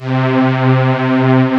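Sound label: strings C4, Roland, Analog; USB Soundscan vol.49; Analog Fantasies; AKAI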